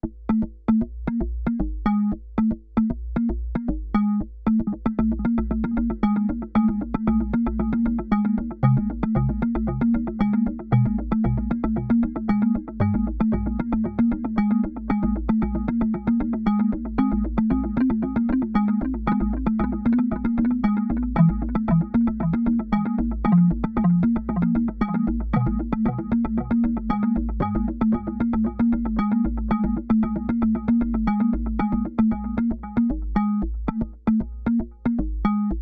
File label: Synthesizer; Boss DD8